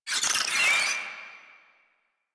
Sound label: Infested Ashen Vocal Attack Death; Warframe